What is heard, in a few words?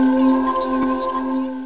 Magic Cast